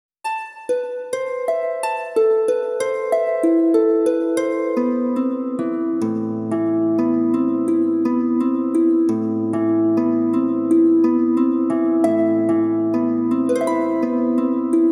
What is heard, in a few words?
Harp of the Wind; Shining Wind music collection